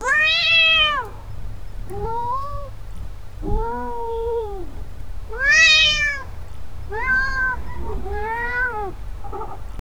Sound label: cats meowing loud; pet